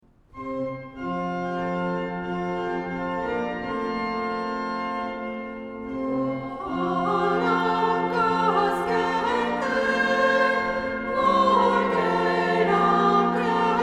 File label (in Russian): Армянская месса; Благодарим Тебя Господи